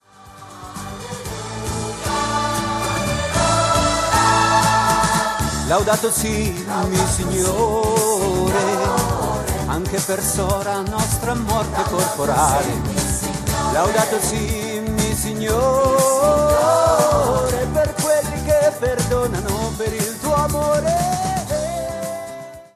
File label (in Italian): Laudato Sii coro